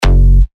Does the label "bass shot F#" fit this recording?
Yes